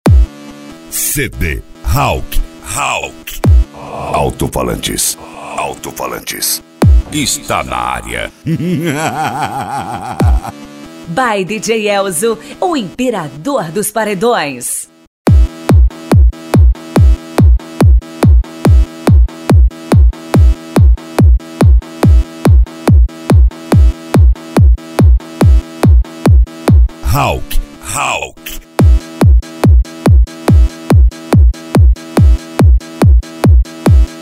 frequencias; hawk altofalantes 2015

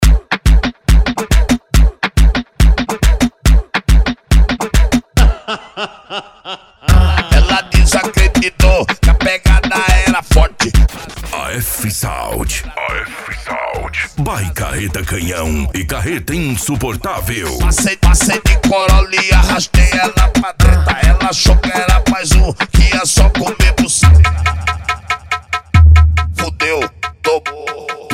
esp funk automotivo; afsound

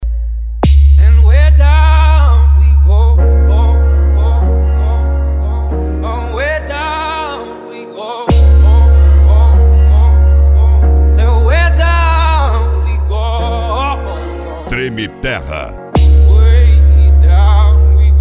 sound car; treme terra

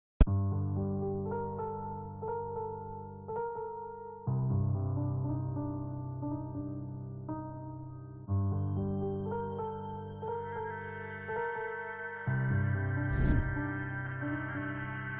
retro graves bass

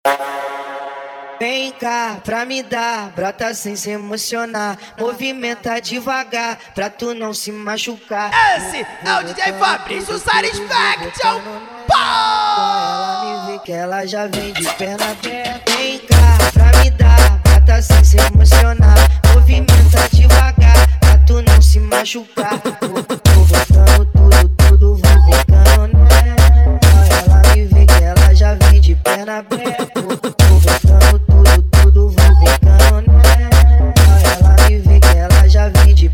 sound car

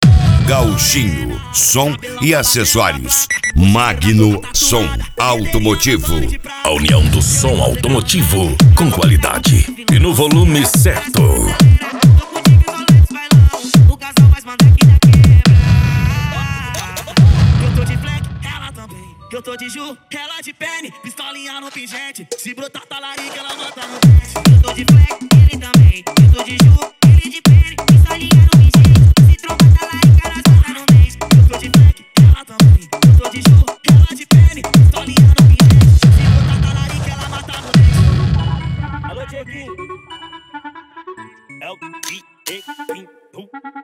som automotivo; gauchinho som e acessorios e magno

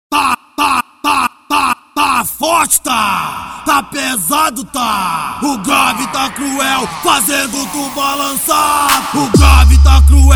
som car

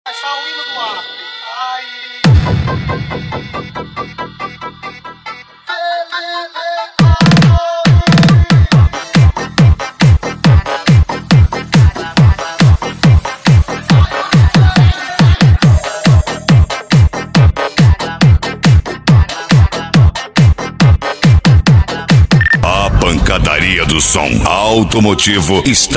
mega funk pancadao

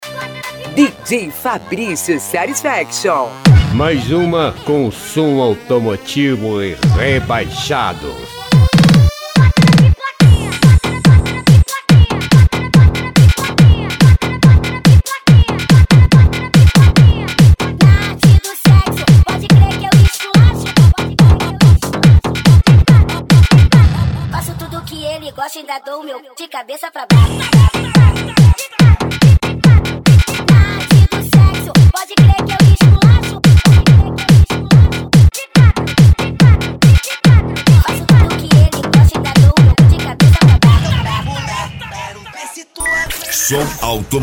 som automotivo; rebaixados

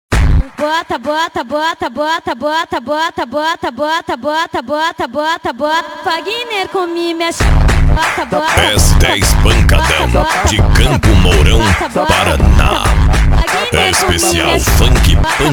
pancadao funk

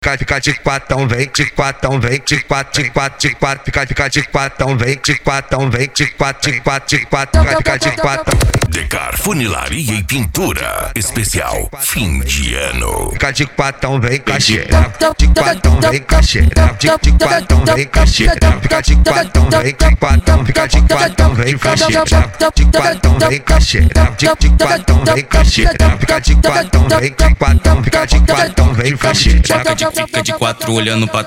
funk ritmado

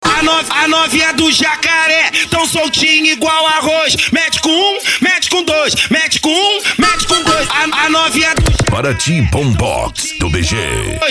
bombox funk

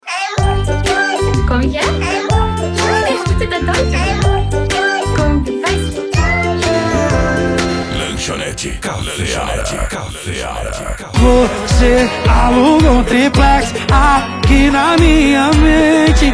sertanejo; lanchonete cafeara

